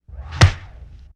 Strike Punch on boxing mitts

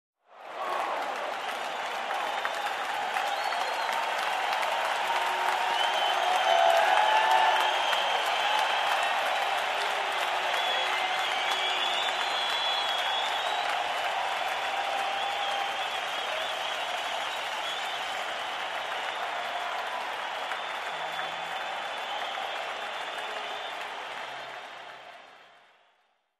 Huge Crowd Applauding and Whistling

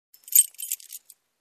Keys tinkle

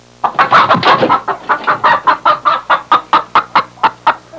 Hens clucking and flapping

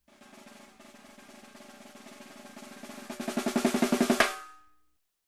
Dynamic Snare Roll Tension Increase

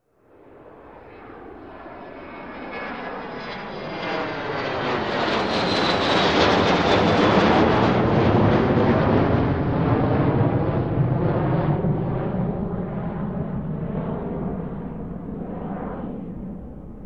Jet aircraft taking off

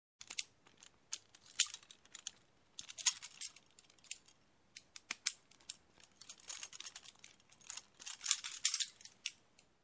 Handcuffs clicking

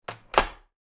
Door close

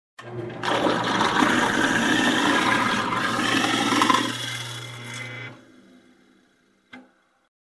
Electric Grinder

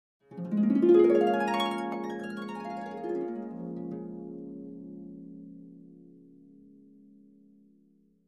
Harp Glissando ascent and descent

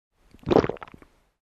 Gulp

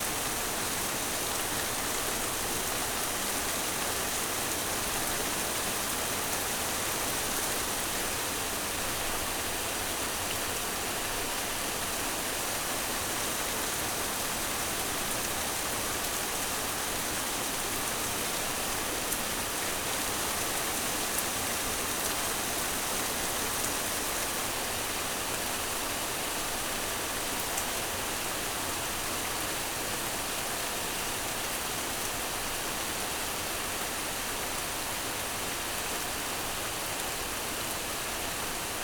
Heavy Rain White Noise

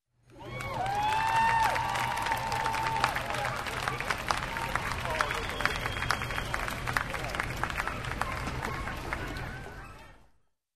Audience watching a dog show applause

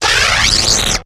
Electric Arcs

